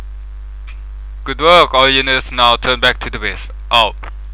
win; all class

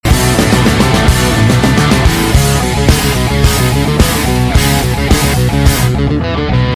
outtro music lose

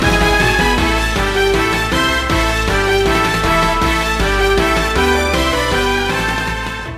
outtro music; freak fortress 2

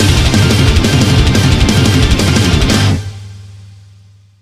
outro music; freak fortress 2; cross

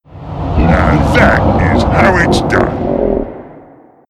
win; freak fortress 2; nightmare sniper

